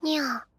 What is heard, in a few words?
menu hit; Epilogue